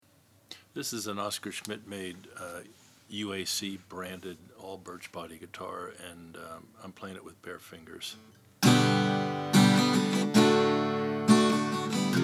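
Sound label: oscar schmidt stella hawaiian, Guitars; 1935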